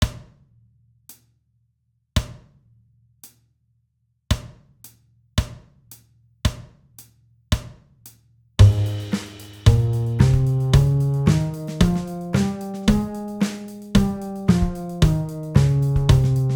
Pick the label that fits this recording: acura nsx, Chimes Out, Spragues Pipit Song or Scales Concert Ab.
Scales Concert Ab